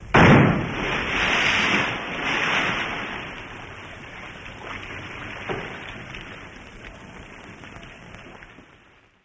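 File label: Water Splash Heavy Lap, splashes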